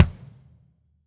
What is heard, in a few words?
Drum Set